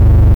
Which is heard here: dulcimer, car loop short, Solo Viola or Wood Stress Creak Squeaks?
car loop short